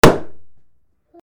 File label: burst